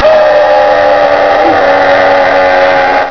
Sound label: SCREAM